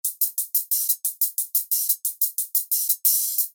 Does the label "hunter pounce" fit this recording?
No